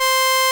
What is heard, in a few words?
SOLINA, String Machines